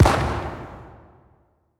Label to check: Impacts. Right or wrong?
Right